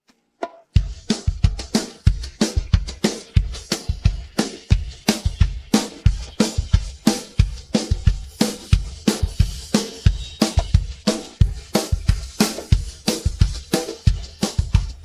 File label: pattern, live, drums